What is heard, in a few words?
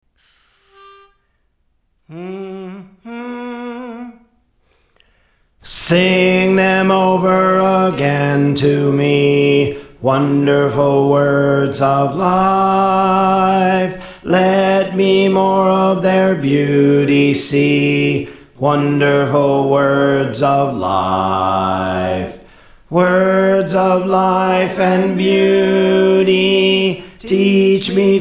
Bass; Praise For The Lord Training